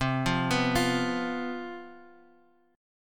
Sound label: C Major 7th Suspended 4th C down Guitar Open F; CM7sus4 arpeggiated